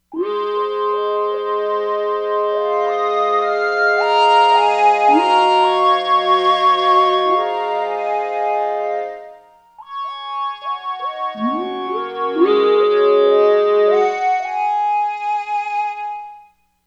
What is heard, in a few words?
GopiVox, Korg MSC-13S Ethnic 2